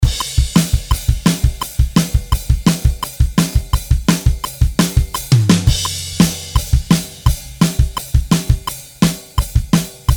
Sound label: Rock Drums after